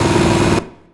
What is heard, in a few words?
CYBER KIT, DRUMS